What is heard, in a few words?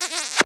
Kiss; Ed Edd n Eddy; Adromulus Pack; EEnE